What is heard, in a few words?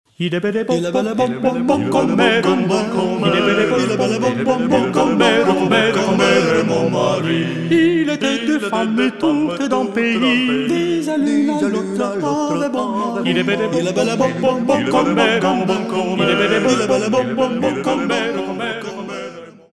Voice Quartet; Il est bel et bon